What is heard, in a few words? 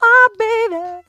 oh baby SUNG; Voice